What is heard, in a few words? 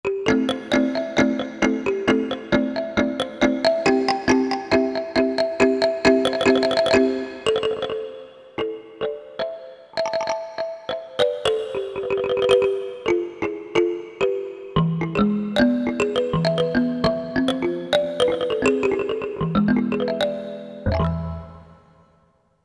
Kalimba; Korg MSC-10S Ethnic